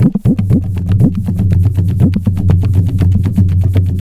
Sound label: Tabla, 120 BPM; Zero-G; Pure Tabla